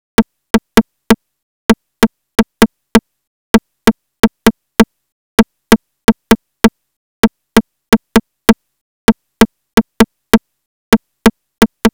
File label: Percussion, Afterhours EDM